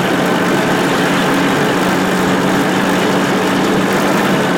tank engine rpm 00; engines; War Thunder

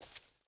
footstep burlap concrete walk; Rust

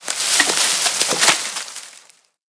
tank collision bush

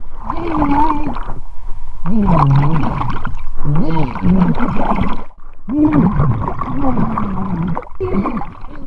under water choke loop

